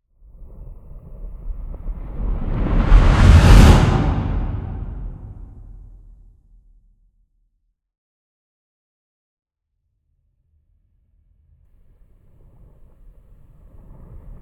Reverse Explosion, Sorcery; Rock The Speakerbox

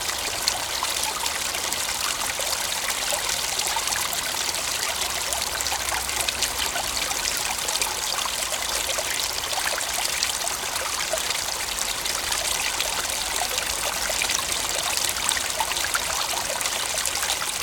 Creek, water, flowing, splashing; Double Trouble Audio

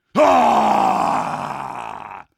scream long, combine soldier; hl2

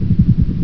physgun loop, weapons; hl2

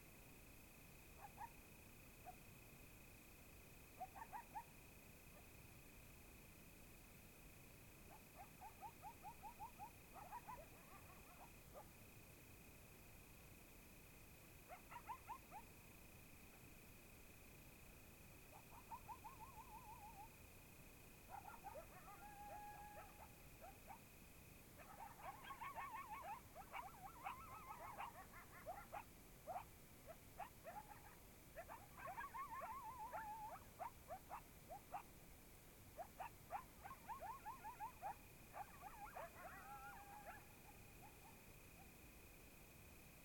Loudest Quiet Coyotes, Oregon; Coyotes 2015; Medlock

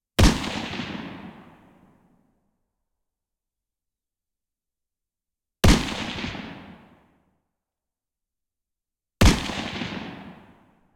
EXT M4 Shots, Guns; Coll Anderson

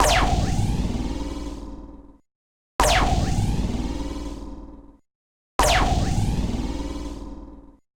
Laser Beam, 90s Anime; Doex Studio; 90s Anime SFX Pack

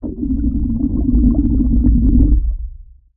Underwater Bubbles Deep; Soundopolis

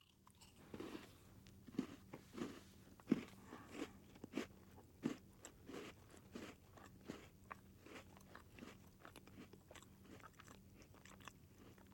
EAT, Eating; TheWorkRoom Audio Post

